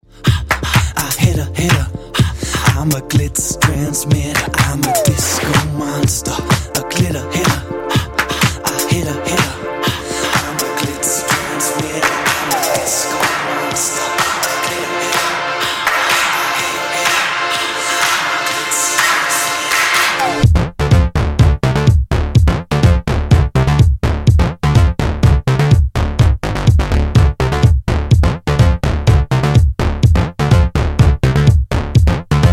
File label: Disco